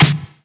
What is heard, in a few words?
Snares & Claps; Vengeance Samples